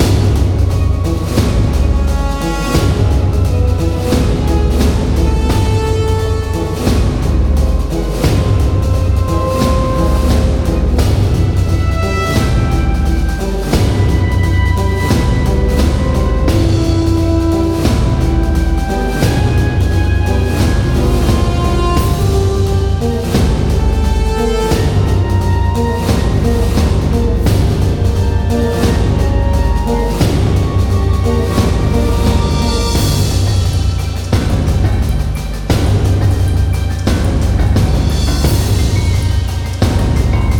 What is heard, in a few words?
big battle; Manamon Music; Manamon 2